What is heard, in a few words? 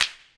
Clap n Snap